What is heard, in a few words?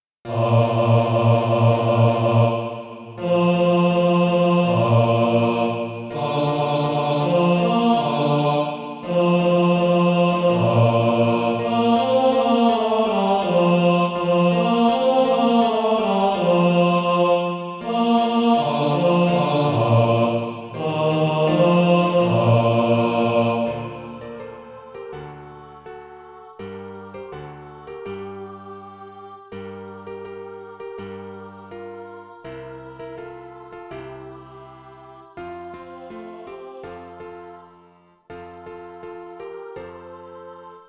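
bas, Practise Music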